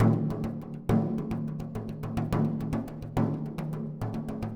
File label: Cheesebox loop